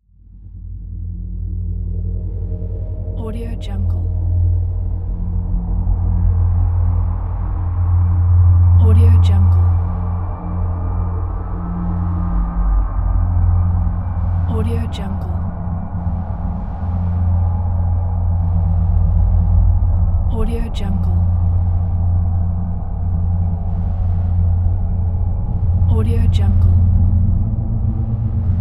drone

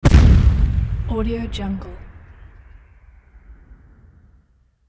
cannon blast; hollywoodedge

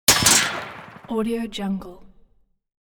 gunshot with multiple industrial impacts

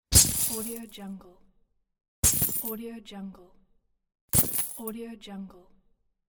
loot box reward

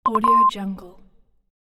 beep; minimal-studio

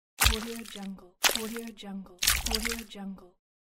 mud splat; tibasound

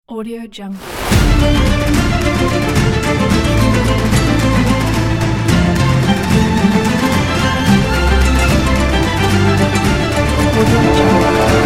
epic spanish football trailer

